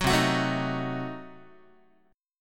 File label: C Diminished 7th down Guitar; strummed; JGuitar